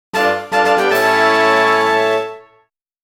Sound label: Get Small Item; the legend of zelda the wind waker hd